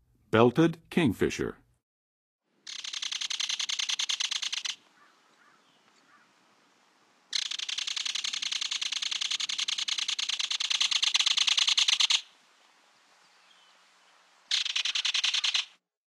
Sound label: Belted Kingfisher, Birds of North America; Western USA; Western USA Songbirds